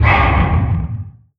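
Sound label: EXPLOSIVE left, percussive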